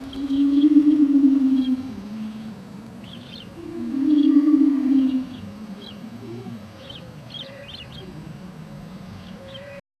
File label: gentle but eerie wind